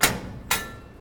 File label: Fuse OFF; Phasmophobia; Asylum